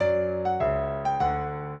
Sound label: minuet, piano; Mozart